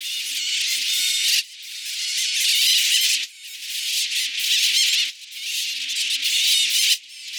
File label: Digital Rodents; Zero-G - Future Wave